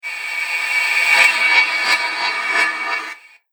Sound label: Horror Movie Sharp Metal Transition; Scary Soundscape Sound Effects